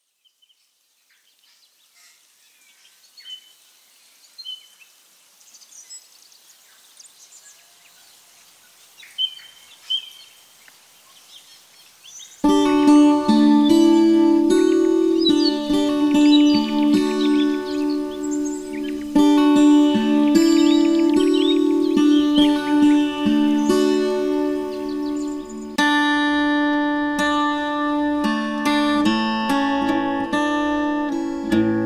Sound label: music ambiance rhodes; smorgasbord